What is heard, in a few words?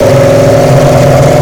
v12 maybach, engines; acf engines